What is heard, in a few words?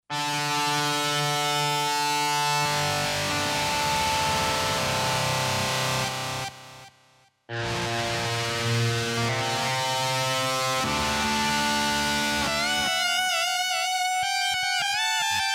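slow gear, guitar; Factory Patches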